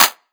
Clap, 909 Claps; Slate; Vintage Drum Machines Volume 3 909 Edition

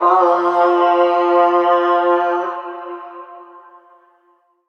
vocal soul, Fm; SD AURORA Sample Pack; Slate

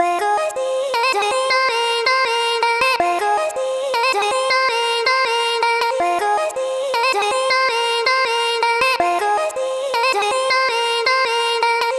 vocal chop, 160, Fm; Slate Digital Omnivox Vocal Sample Pack; Chops

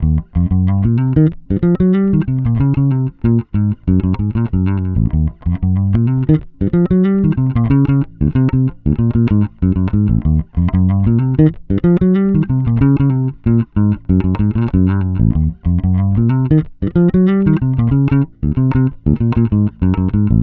Bass loop 94 Eb, Electric Bass Loops Direct; Slate Digital BASSHOLE Sample Pack

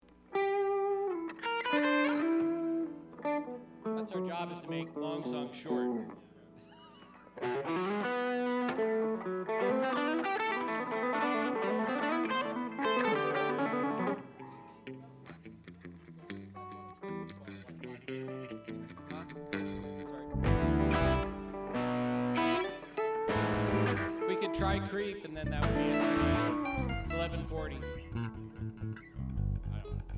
Strings Mix

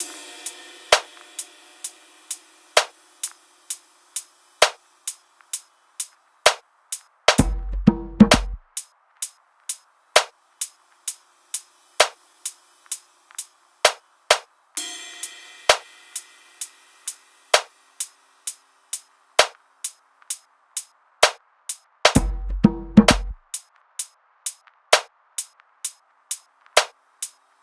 tops 65, Drum Sample Pack, Top Loops; SD SEISMIC; Slate

